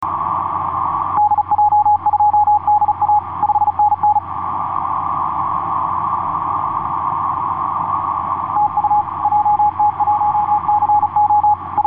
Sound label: 30m cw; jt1co; dj2da